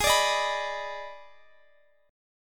D sharp Major 7th Suspended 4th Sharp 5th, G sharp